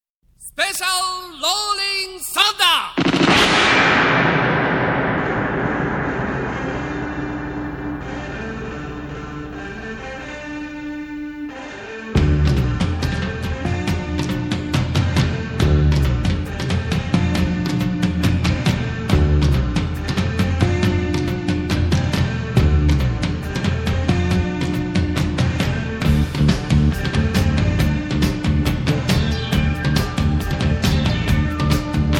thunder drum; bet on the ring image album

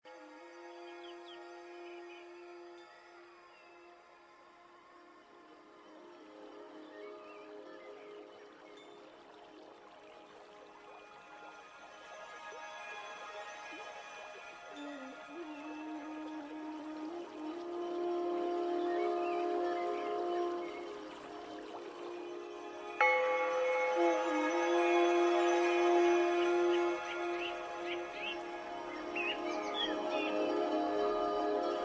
Meditation Oriental; Heaven Forest VR MMO

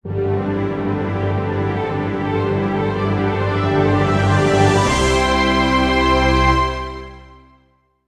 Transformation Music; long live the queen

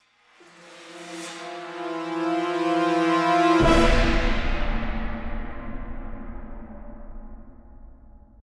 Music Stings stinger; Kragle

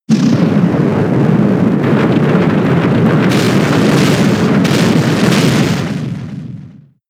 Earthquake; pokemon sfx gen 7 attack moves sumo usum

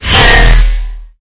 Pickups Powerups

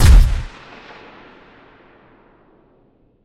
Sci-Fi Weapons-Sniper Rifle Single Shot

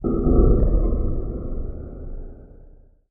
Ambience Short; Empyrean Ambience 01

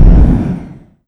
jetpack end

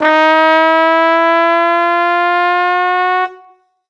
Trombone Slide Long; super crazy rhythm castle

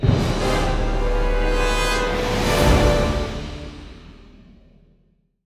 Music Jingle Enemy First

